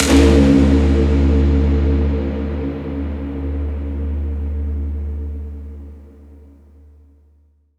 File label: Ping, Trailer; Federico Soler Fernandez; Effective Trailer Pings